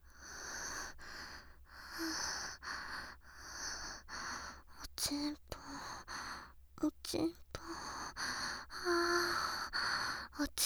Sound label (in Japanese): 喘ぎ